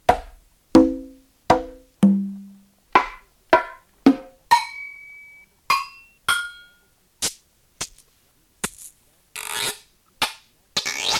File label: Casio Rz1 DIGITAL SAMPLING RHYTHM COMPOSER; Casio Rz1 Sound Collection cassette SideB